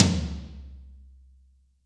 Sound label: AMB TOM; DRY+AMB TOM